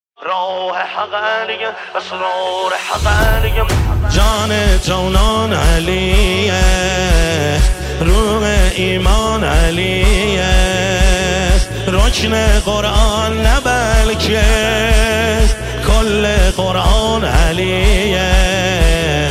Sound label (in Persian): مداحی